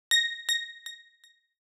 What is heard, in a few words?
Click; adarkroom